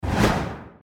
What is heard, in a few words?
Text Notify Whoosh; slingo adventure; GRush